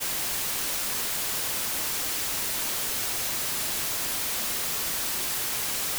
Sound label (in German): weisses rauschen